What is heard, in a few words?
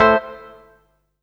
Synths C; Vengeance Samples